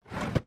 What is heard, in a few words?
drawer close